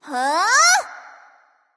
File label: nv confused; cupid